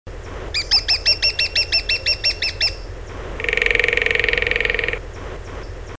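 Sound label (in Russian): malyy pestryy dyatel golos, lesser spotted woodpecker voice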